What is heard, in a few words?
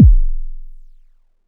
Kick, Drum Hits